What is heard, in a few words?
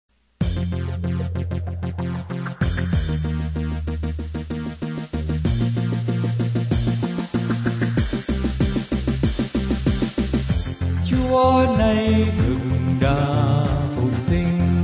alto